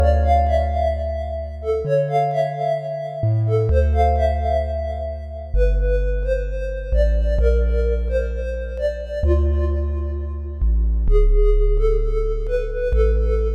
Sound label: dungeon puzzle; LFH dungeon1 puzzle WIP